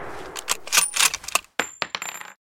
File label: Bullet Loading